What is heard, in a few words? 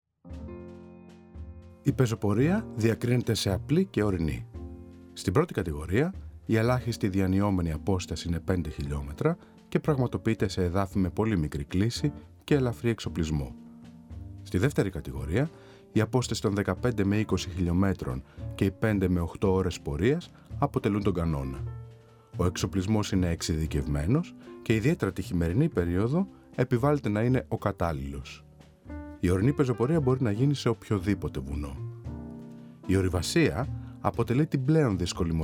Greek Music Mix